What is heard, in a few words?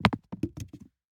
DICE on Cardboard, Throw and Roll, Foam, 2 Two Dice; Samples; ArticulatedSounds; DICE Sfx Party (Extended)